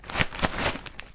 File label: shaking a crisp bag